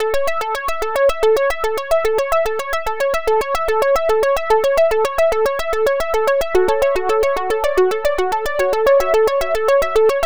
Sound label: verse sparkle